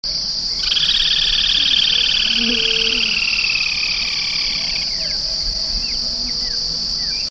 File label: Laterallus xenopterus